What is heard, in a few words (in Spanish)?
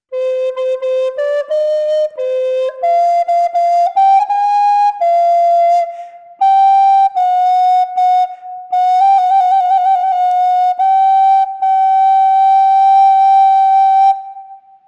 flauta dulce